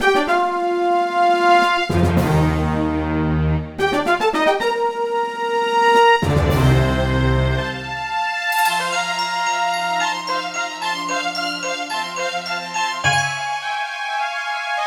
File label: Wind Temple Clear; the legend of zelda the wind waker complete soundtrack gc gamerip 2003